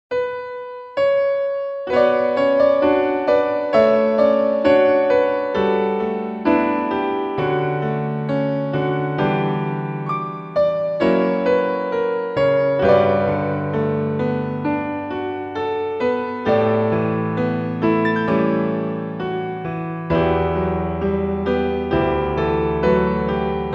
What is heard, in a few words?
Before The Daylight -Piano inst-; Growlanser IV Over Reloaded